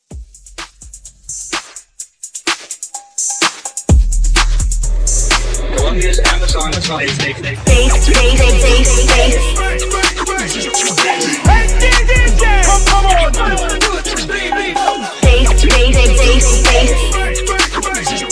Super Scratch Bass 127

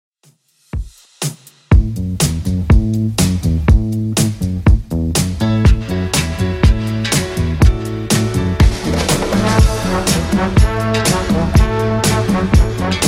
60s Redrum 122bpm

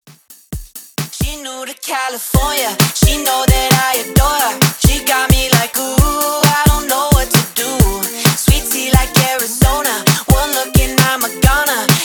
Top40s Dance Clean Intro 132 BPM

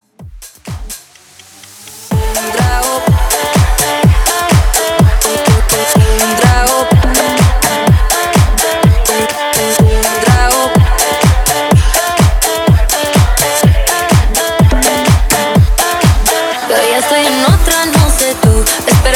House Latin Top40 Hook In Outro Extended 125 BPM

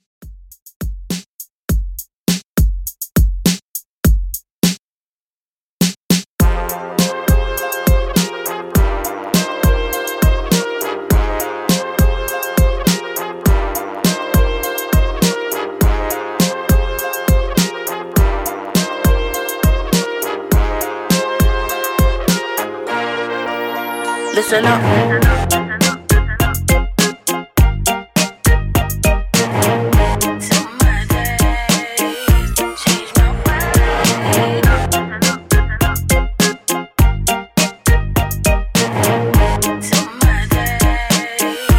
Old School Redrum 102Bpm

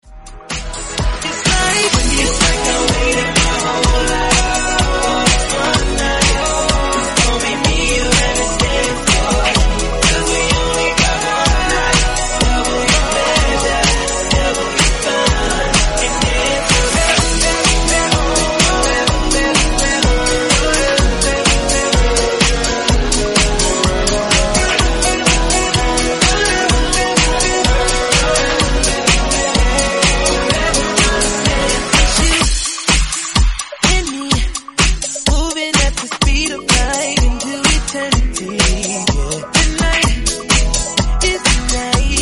126 bpm B

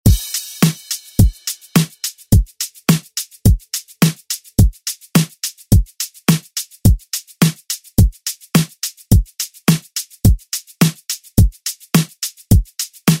ReDrum; DJ Mhark

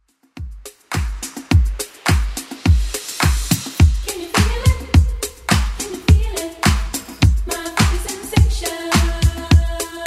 80s Club Edit 105bpm

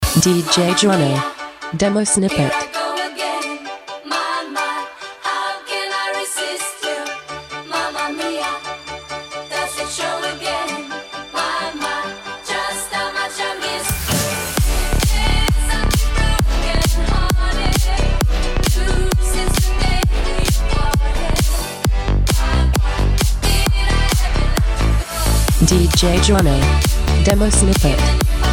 Bounce

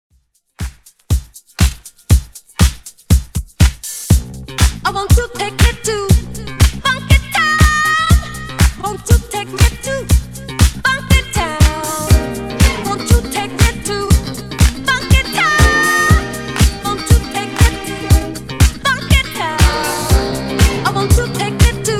Redrum 120Bpm

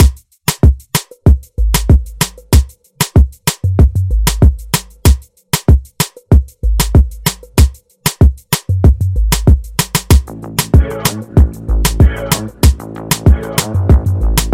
Top40 Bouncy Afro Redrum; Remixfreakz

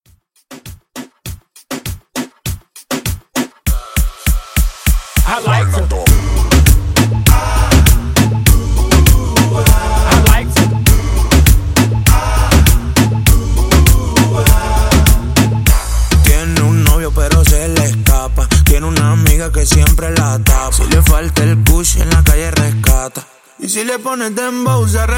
Latin Reggaeton Smashup; Remixfreakz

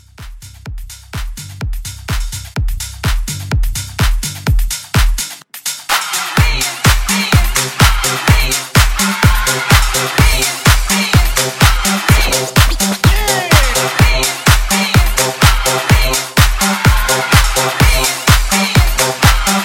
House Mixshow Reboot, 126

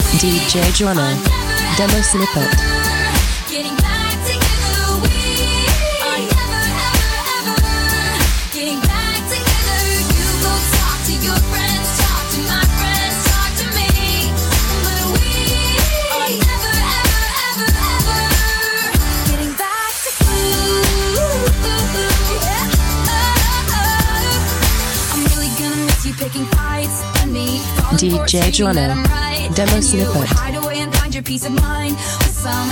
95bpm, G

